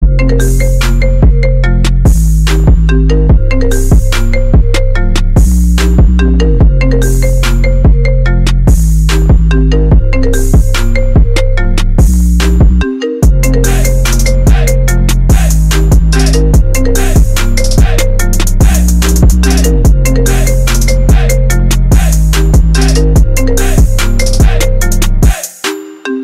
iphone trap remix; iPhone 13

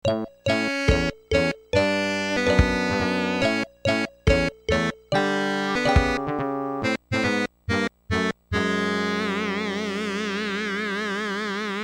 Game Over; Sonic

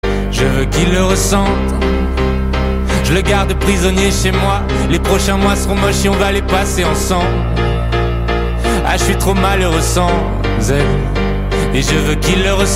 decrescendo

